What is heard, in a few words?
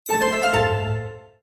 win; Elven Princess